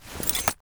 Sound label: knife push look at start; weapons; Counter Strike Model Pack; CS2